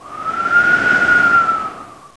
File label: STRONG, NATURE